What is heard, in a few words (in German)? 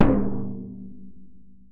Frame Drum Außer Kreis